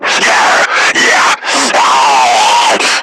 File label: Screamer Idle; Warframe; Lasrian